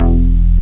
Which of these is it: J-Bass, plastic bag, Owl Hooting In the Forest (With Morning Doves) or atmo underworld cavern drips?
J-Bass